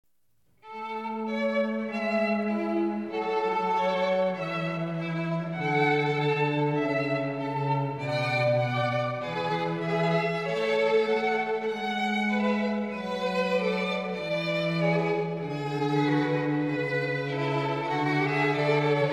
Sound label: Two Violins & Cello; Corelli, Largo & Corrente from Sonata Opus 4 #3 in A Major